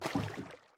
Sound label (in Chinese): 船在水中划桨声